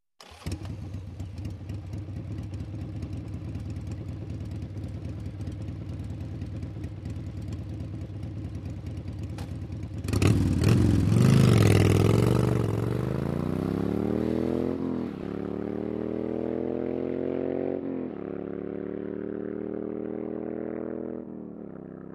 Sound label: Motorcycle starts, waits, drives away